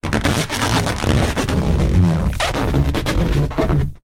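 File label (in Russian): чёткий надсадный скрип резинового шарика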